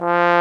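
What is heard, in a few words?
brass, Solo T.Bone; Roland L-CD702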